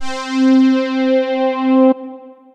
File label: space pad; Synth Pad; Ableton; Chop and Swing